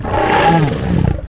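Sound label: warrior chirp, bugs; Starship Troopers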